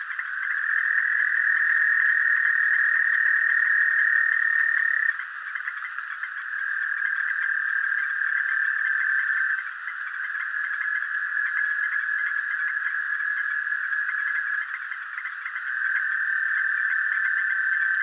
Incilius melanochlorus; Bufonidae

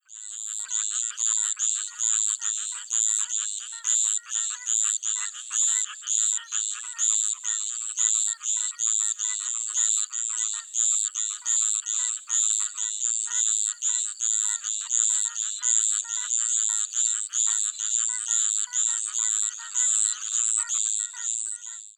Litoria microbelos

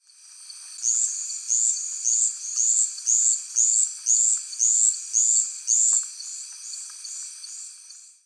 Litoria microbelos; Euluma Ck Rd

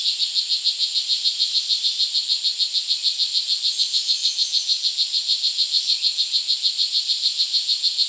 Dendrobatidae Oophaga pumilio

